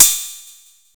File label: piercing open hi hat one shot a key, Royality free open hat one shot tuned to the A note